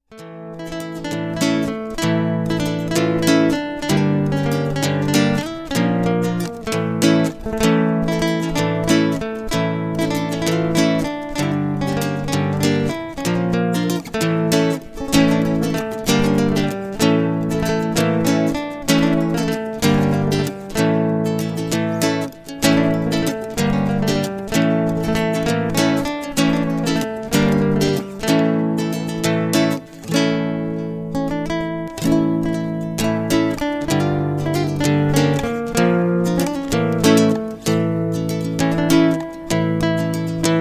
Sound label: Guitar